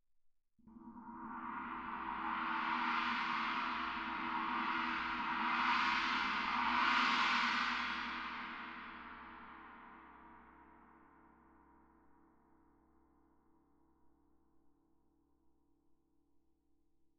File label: 19 chinese, mallet, roll, suspended cymbals; Percussion